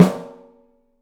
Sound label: OPEN STEEL SN; 3D Drums